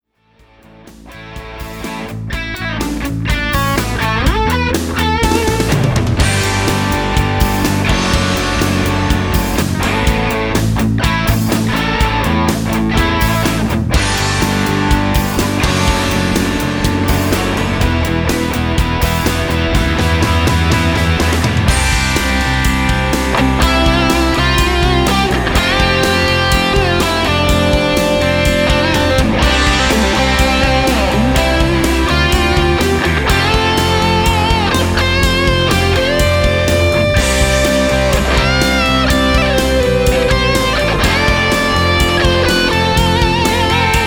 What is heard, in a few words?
Guitar Diddy Lead Sample